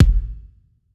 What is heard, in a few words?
Elec Kick, drum